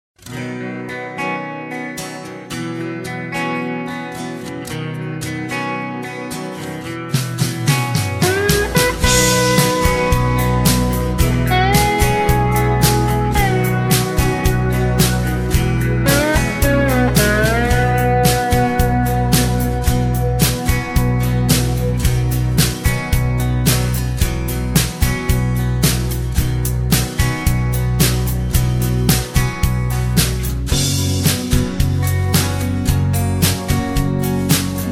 No Backing Vocals

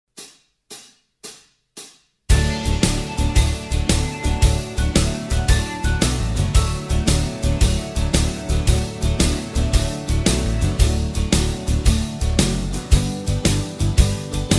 (D) No Lead Guitar

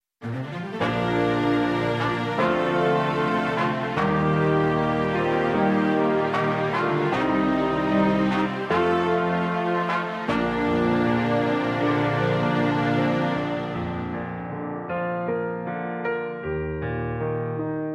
(Bb) No Backing Vocals

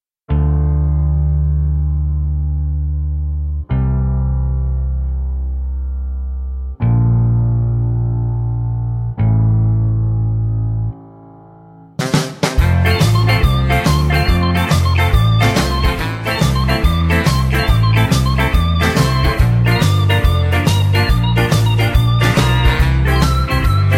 F No Backing Vocals, backing tracks

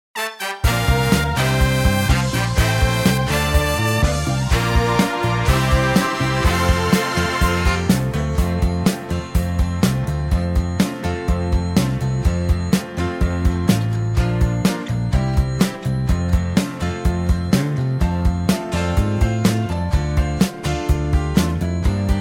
F To Gb No Backing Vocals, backing tracks

